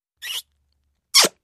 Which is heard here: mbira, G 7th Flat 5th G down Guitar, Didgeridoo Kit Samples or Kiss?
Kiss